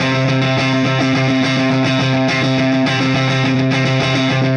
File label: Clinical Distorted Funk Guitar